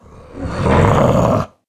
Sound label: alert, male zombie; nmrih; zerosiki; slender